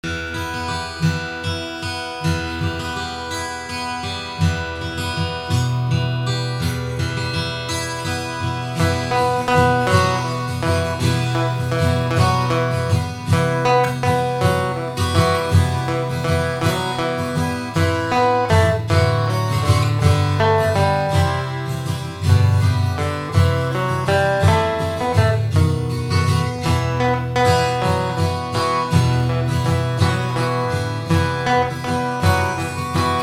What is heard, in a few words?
my favorite strings